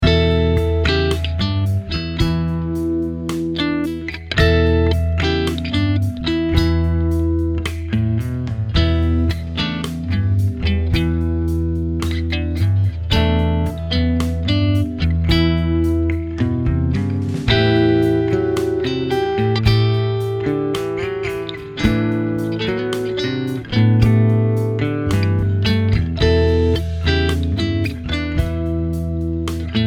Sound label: blues loop